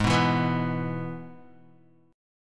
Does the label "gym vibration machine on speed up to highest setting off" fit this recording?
No